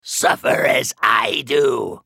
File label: attack; Trundle